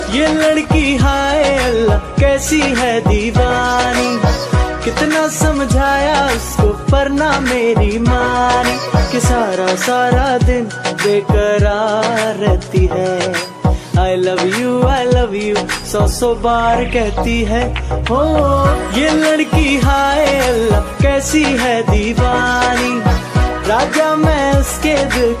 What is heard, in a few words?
punjabi love ringtone, bollywood ringtones